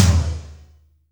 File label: TOM, Drum Dry; East Collexion; Drum 1